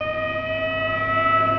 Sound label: Fire Truck; simtower